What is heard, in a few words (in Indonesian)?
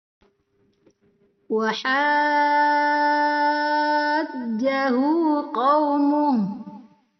Perekaman baru Karaoke denoised